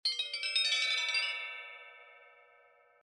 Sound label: tone